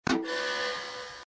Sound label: sub Bump; jetpackLearningPlatform; bubble-trouble